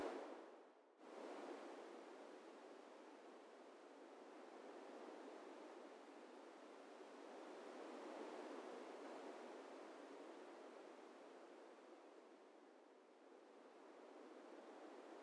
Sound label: Wind; Ambient